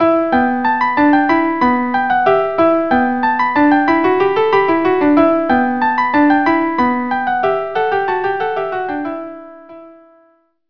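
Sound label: Freylekh violin violin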